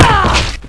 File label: medium impact